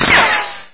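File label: cartoon ricochet sound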